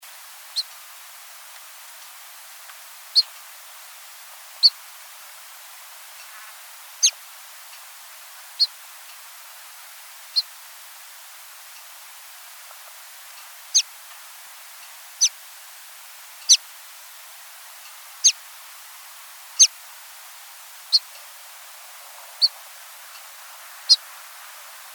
red backed sierra finch

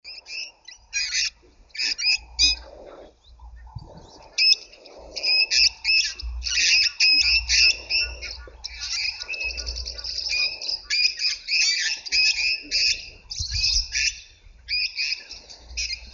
cape parrot

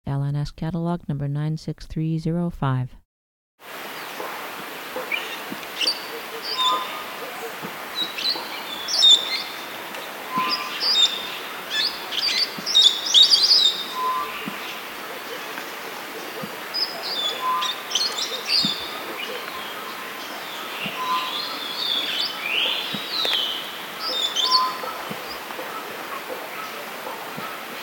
yellow fronted parrot

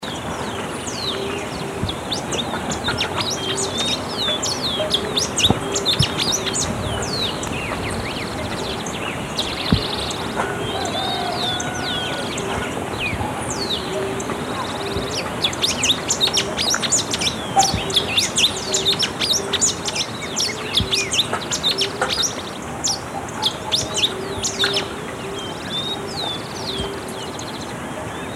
rufous sided warbling finch

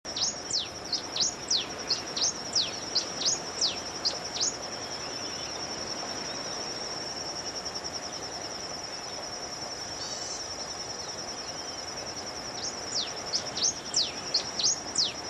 black and rufous warbling finch

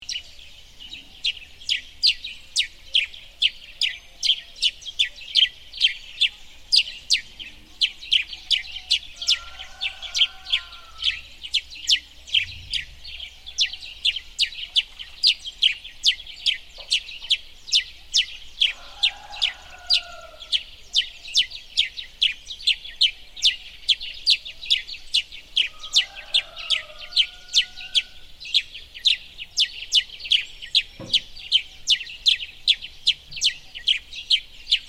grey headed sparrow

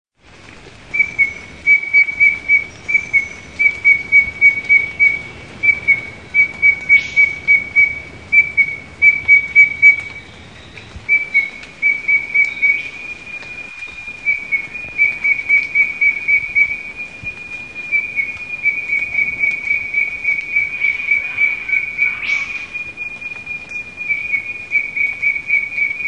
ashy robin

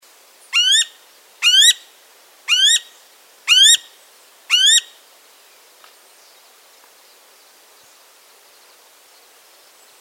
crimson mantled woodpecker

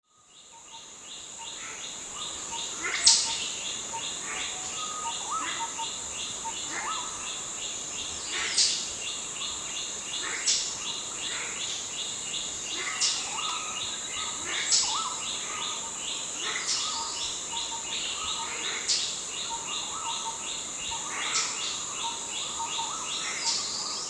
silver pheasant

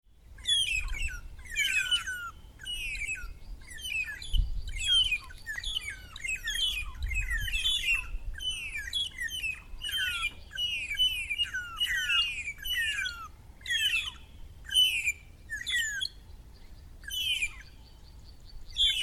magpie shrike

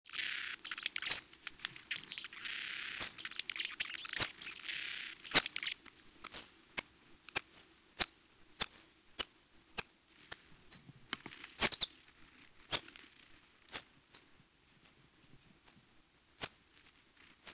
blue throated hummingbird

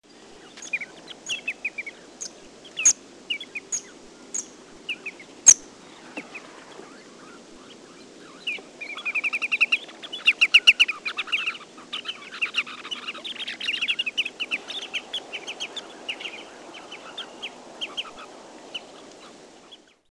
white rumped sandpiper

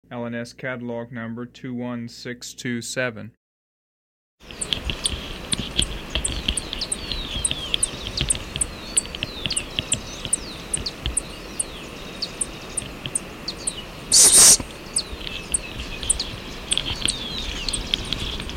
spot throated hummingbird

